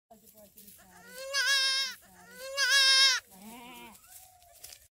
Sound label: Goat baby sound; صدای بز